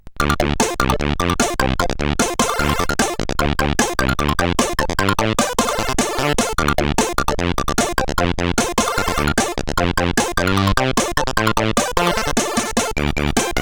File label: Ninjackie, CSG8580R5